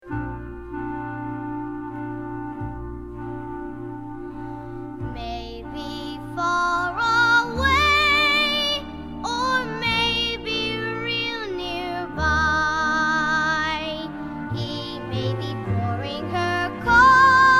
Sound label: Vocal Guide